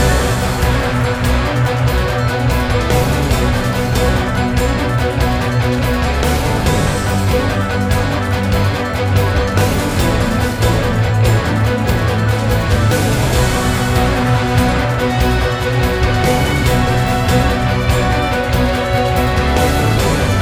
Liberation Charge Attack Loop; Granblue Fantasy Versus

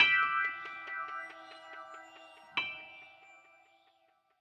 Recognition Stinger; batman the enemy within

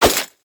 Dodge

